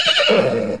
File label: horse system default